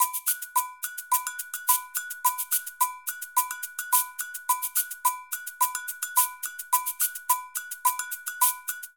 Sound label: Afoxe Cabasa & Agogo-107bpm; Latin Percussion; Ableton; Factory Packs